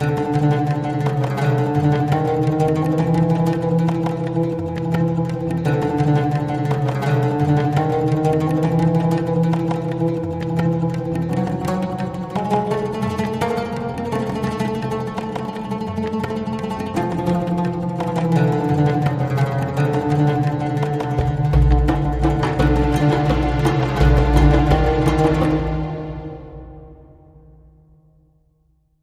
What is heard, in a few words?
Mid Flute